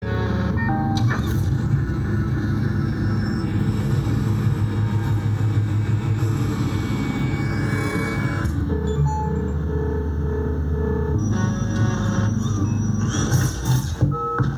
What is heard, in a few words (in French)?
Alarme; Resurgence